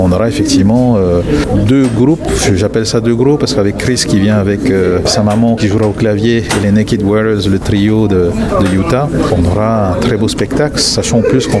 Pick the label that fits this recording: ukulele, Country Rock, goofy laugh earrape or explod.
ukulele